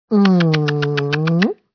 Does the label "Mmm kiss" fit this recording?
Yes